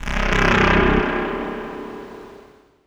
BASS (C), Pedal One Shots; Matter; Cymatics; ANALOG EVOLUTION Beta

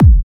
Kicks

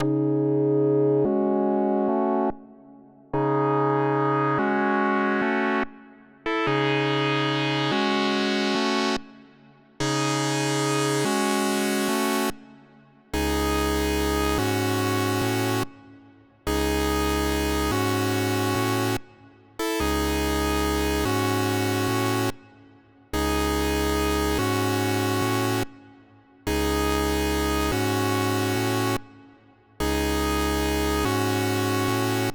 144 BPM C# Min Saw; Melody; Loop Stems; Cymatics; 2022 Melody Collection - Wavy; Playboy

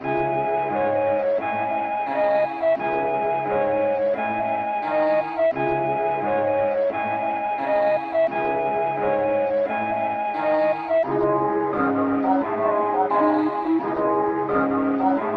87 BPM C# Min, Melody, Trap; Cymatics; 2022 Melody Collection; Trap Pt 1

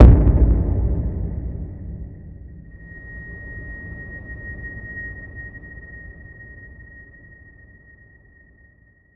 Epic

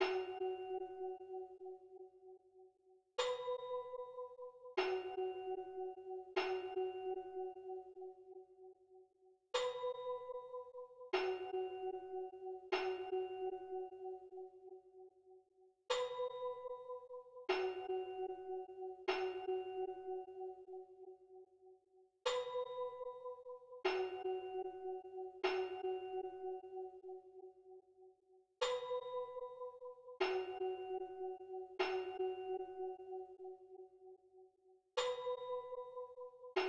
151 BPM B Min Bells, Trap; Cymatics; 2022 Melody Collection; G Wagon

